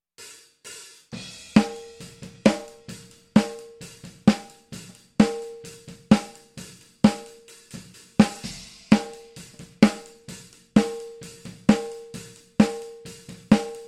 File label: ungated snare